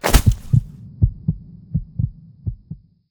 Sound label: fall big, damage; Minecraft; Conquest Default